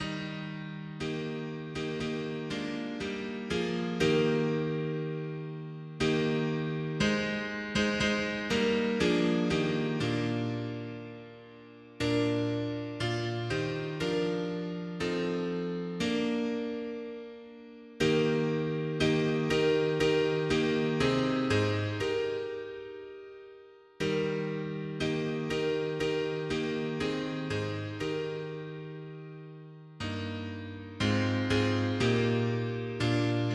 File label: mono piano; hymns; Himnario grande